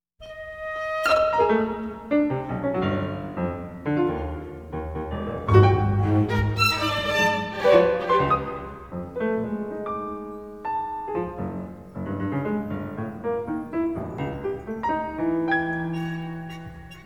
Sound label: Movements For Piano And Orchestra; Stravinsky; I (eighth Note)=110